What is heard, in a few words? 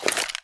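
incendiary grenade draw, weapons; Counter Strike Model Pack; CS:GO; incgrenade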